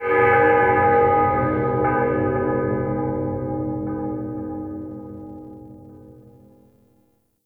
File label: Distant Town Bell; Warframe; Duviri